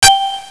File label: DING